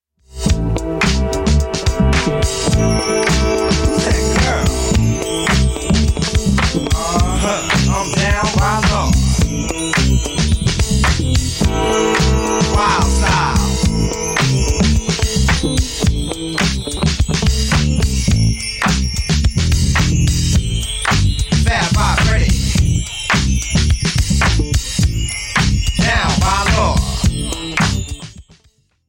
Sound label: breakbeats